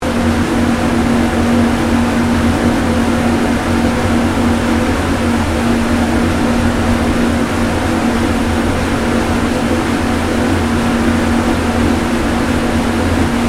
MACHINERY INDUSTRIAL REFRIGERATION PLANT OPERATING STEREO